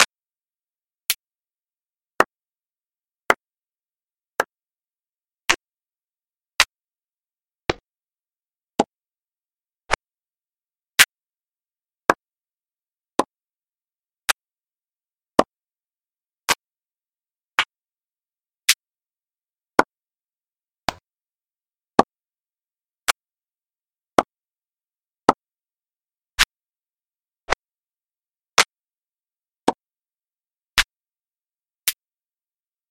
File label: clap short; STOLTINGMEDIA